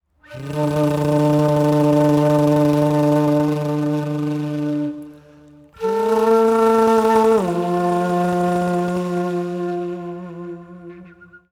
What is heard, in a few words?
FLUTE ETHNO 125